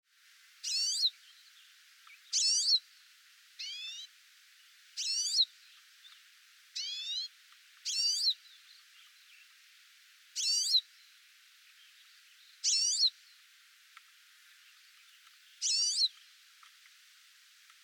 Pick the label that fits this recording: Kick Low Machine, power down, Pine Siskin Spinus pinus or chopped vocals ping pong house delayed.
Pine Siskin Spinus pinus